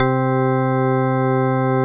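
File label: METAL ORGAN; Greytsounds; Scott Peers Sound Engineering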